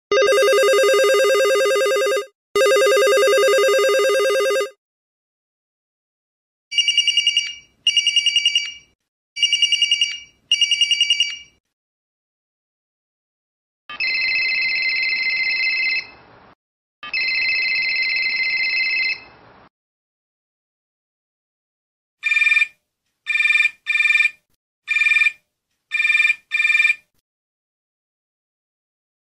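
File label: Motorola, ringtone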